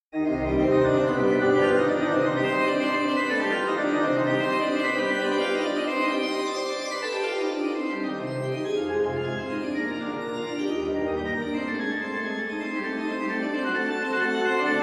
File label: Solfeggio e moll; Bach